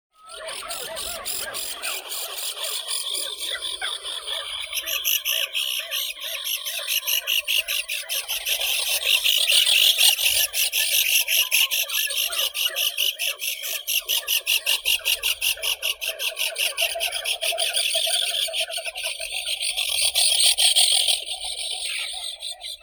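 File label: least auklet, Aethia pusilla; konuga kroshka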